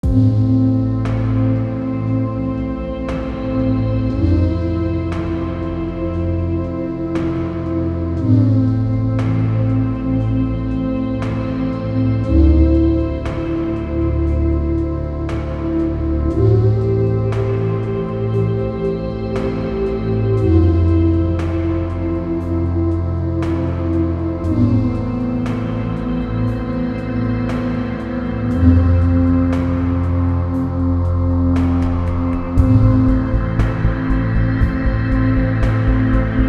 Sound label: Ambient; Ambient Music Pack Vol. 6